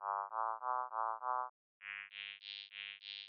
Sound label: pitch bright same